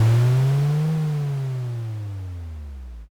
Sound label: dragon